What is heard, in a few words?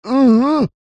human male middle aged rude are you thick type grunt